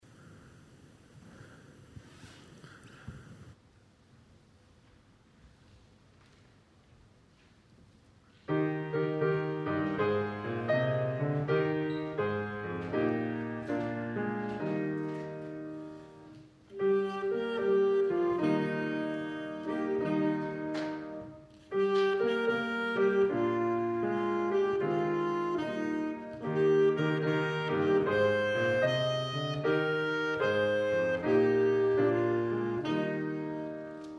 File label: Anthem Sax